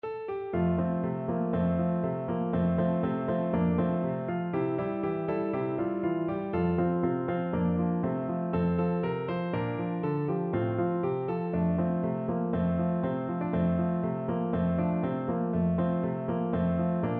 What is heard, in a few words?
old maid garret, piano